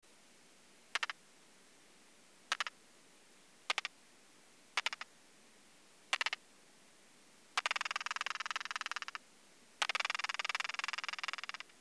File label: Leptophyes discoidalis lab recording 26°C bat detector at 40 kHz